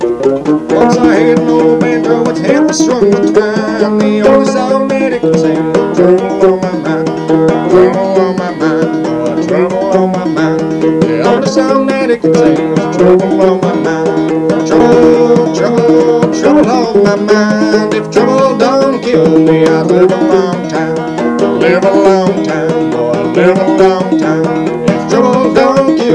banjo

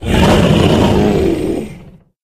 giant threaten

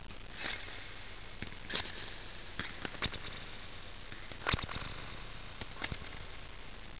backstage

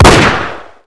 gun

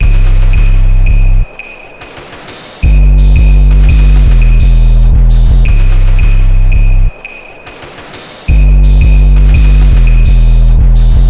bass

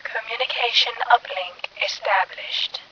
uplink, science

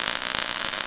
spark

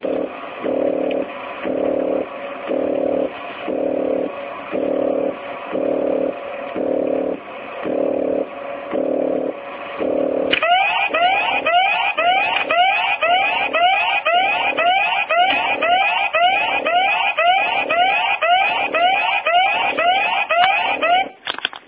ambience

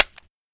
empty, m14ebr; ins2

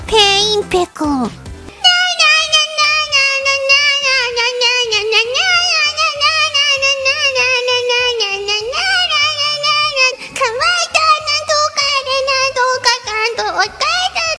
pain; chatsounds; peko

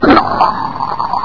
friendly attack; sven

